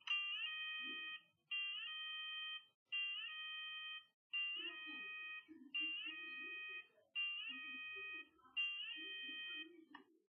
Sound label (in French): sonnerie ppms